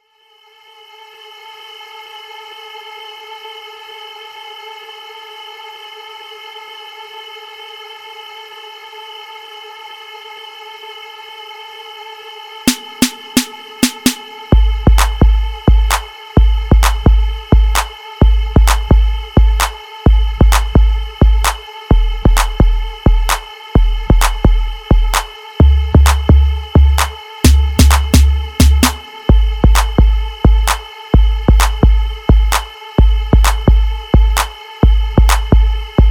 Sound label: Instrumental 90